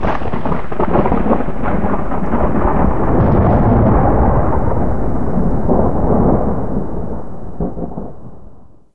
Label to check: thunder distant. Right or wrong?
Right